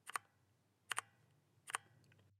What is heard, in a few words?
black switch keystrokes